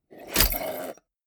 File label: mechanics small snap